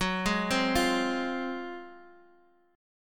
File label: F Minor F sharp, Fm/F# chord; arpeggiated; JGuitar